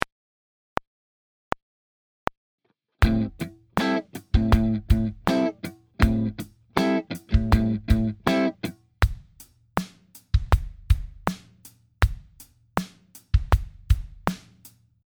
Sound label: groove